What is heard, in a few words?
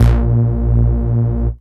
Root A#, Ultimate Bass; Vengeance Samples